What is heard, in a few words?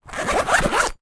office zipper stationery pouch